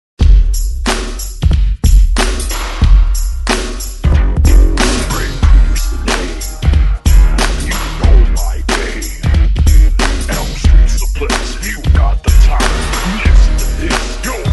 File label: Haunted Bass Mix